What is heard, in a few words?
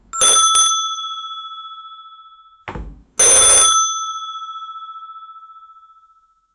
doorbell